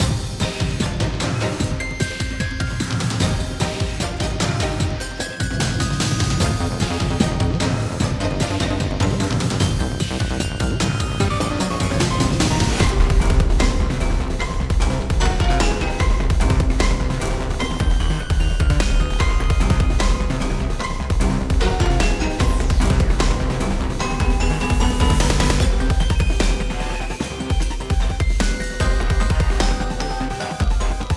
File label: BOSS Steampunk; Zombie Rollerz; Pinball Heroes